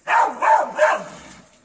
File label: Riding Dogs